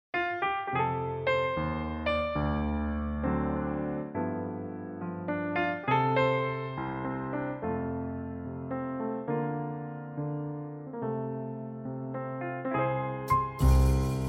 clarinet

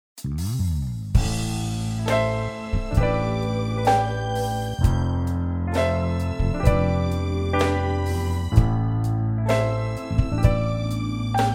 Am, sax

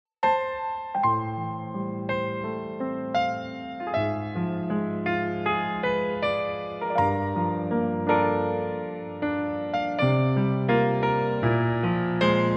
F trumpet

